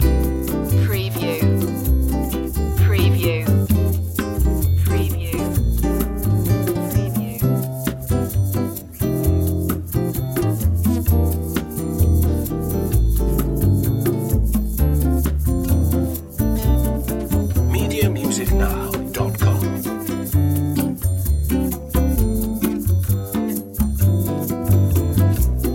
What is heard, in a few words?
bossanova